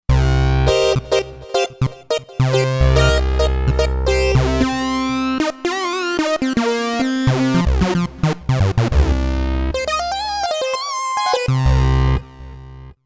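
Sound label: Lead P5 Sync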